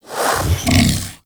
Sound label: Vocal Breathe Fast; Warframe; Hunting Critters; Undazoa